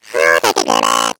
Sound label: tractor beam; player